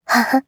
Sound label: laugh, JPN; Eternal Return; Katja